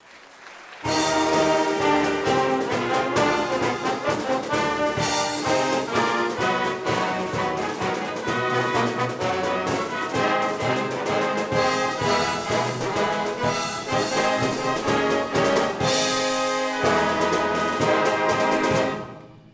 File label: Sax N Brass - Fanfare; The Sacred Heart